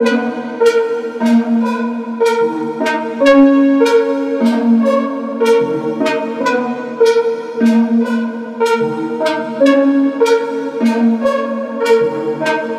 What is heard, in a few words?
synth loop 150 D#min; holdingpattern2; Virtual Riot